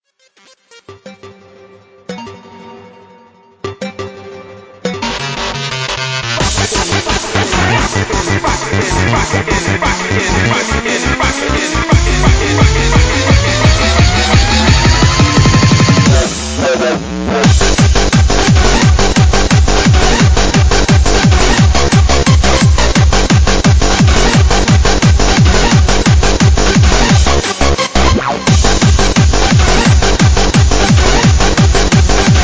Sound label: BEAT KICKS